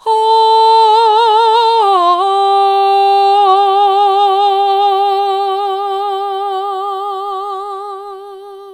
ahh hard, vocal

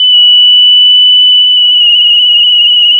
pop, sound of a tea kettle whistling

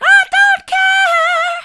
i don't care mid, vocal

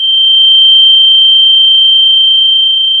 Sound of a tea kettle whistling

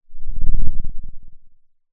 pop

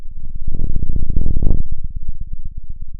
pop, Sound of a thunderstorm

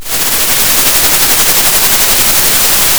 Sound of a thunderstorm

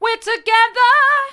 we're together hard, vocal

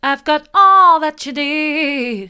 mid, vocal

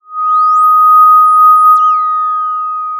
Sound of a police car siren

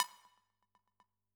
Perc, drum

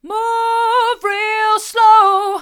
move real slow hard, vocal

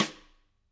K2 room SdSt, drum

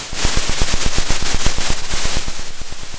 pop, Sound of a drum roll

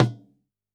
Tom, drum

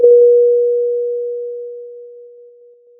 pop, Sound of a church bell

